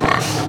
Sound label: attack